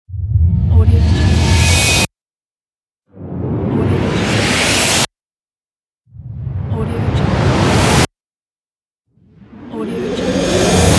risers; rawaudiolab

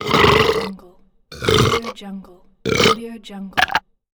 foam burst burp; lokohighman

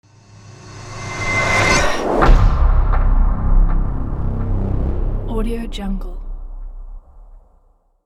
powerful intro logo

